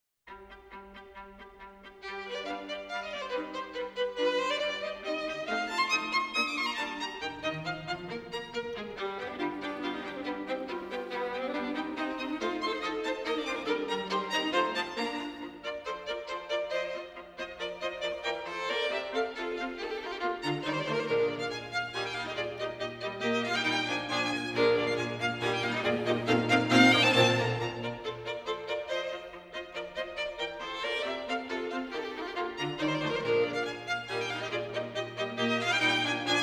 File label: String Quartet in B-Flat Major, Finale. Allegro; String Quartet No. 13; Op. 130; Ludwig van Beethoven; Beethoven - String Quartets Nos. 1-16